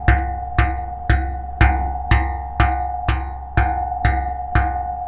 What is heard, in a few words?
Pipe